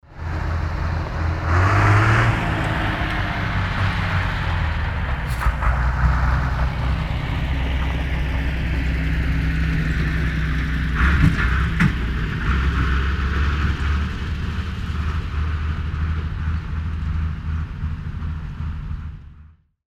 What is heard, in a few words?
truck leaving a site